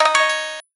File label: gayageum zero, keytone